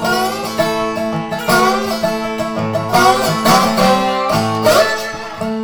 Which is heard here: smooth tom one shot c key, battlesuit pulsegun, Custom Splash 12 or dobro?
dobro